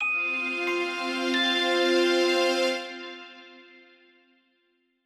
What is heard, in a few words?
Item Drop; gokulTheme